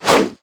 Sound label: basic attacks; Vi